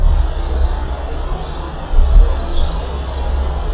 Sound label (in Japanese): iwatubame; zukan